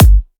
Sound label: Kick, Psytrance; One Shots